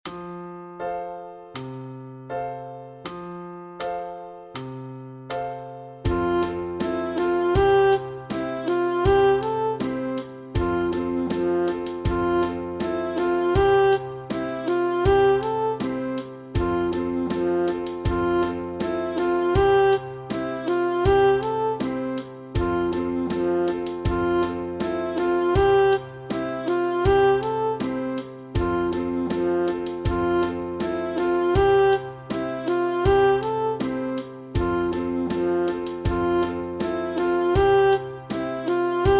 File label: Sax Study